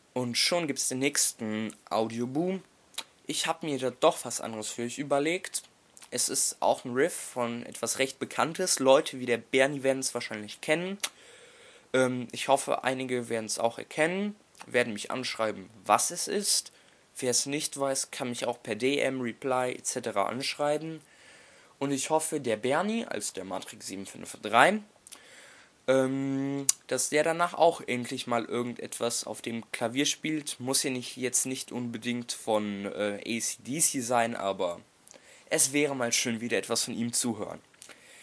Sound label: harp riff